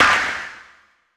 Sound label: SYN FX, FX PERCUSSION; AKAI S6000 CD-ROM - Volume 5